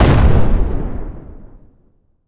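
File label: Kamikaze Explosion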